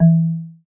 Marimba

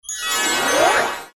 suction Star; LG GD900 Crystal; Game Sound

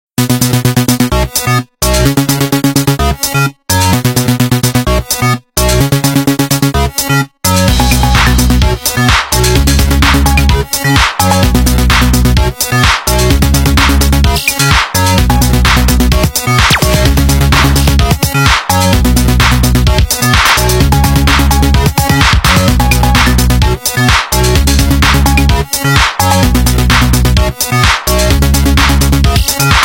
Frequency, Nokia 6760 slide phone tones

